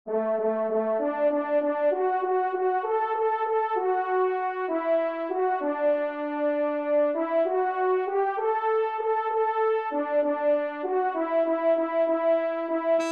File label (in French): Fanfare Do Maj TRP; 2e Fanfare; Corrette